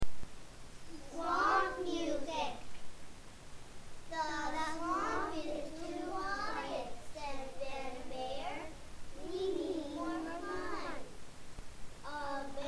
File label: swamp music